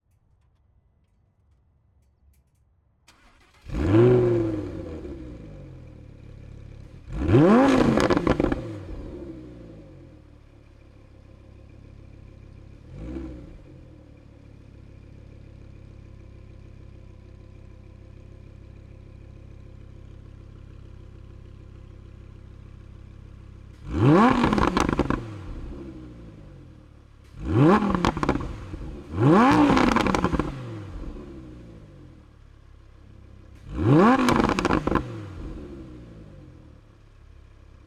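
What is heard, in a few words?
lamborghini huracan exterior start idle blips off; 2014; Pole Position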